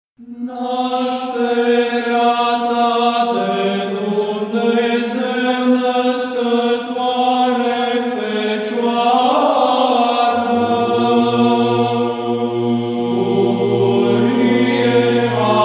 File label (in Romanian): Troparul Nasterii Maicii Domnului glas 2